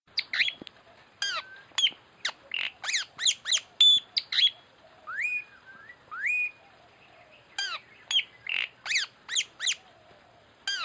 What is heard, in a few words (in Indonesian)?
nuri tanau